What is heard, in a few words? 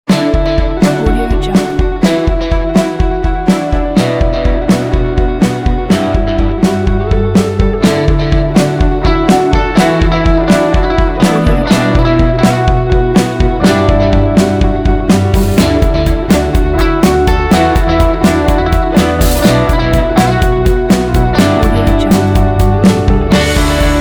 cool inspiring upbeat indie